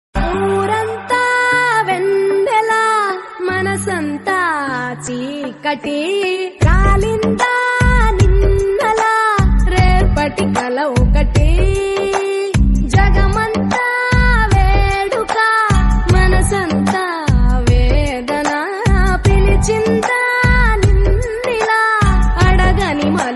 telugu song

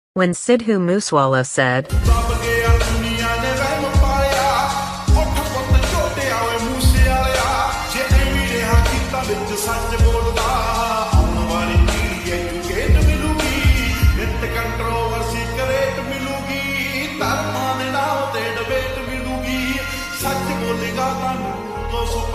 slowed and reverb sound

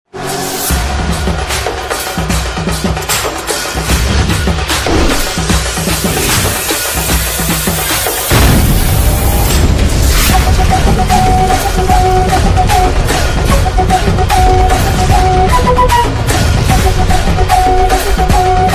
flute bgm ringtone; maanaadu

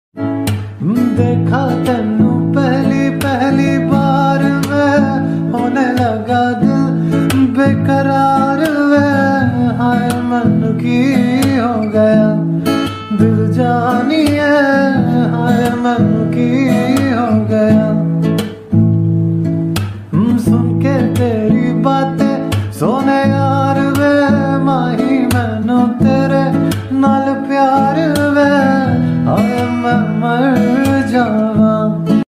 love ringtone

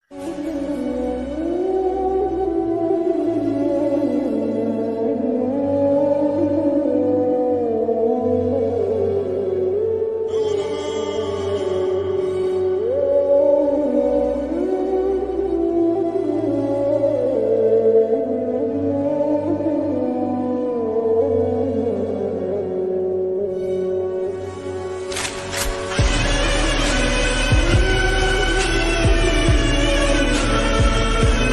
struggle people best song ringtone

